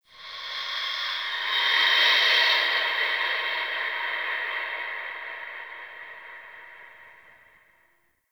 Haunted Breath, Ambience; Warframe; Shadowgrapher

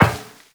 Step Metal Perforated, Footsteps, Walk; Warframe; Tenno

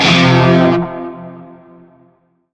Shawzin Chromatic Chord I; Music; Warframe; Narmer

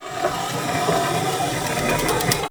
Windmill Piston Raise; Warframe; Eidolon Plains

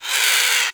Avichaea Tranq Dart Grunt; Hunting Critters

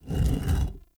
Shrine Puzzle Stag Turn; Doors

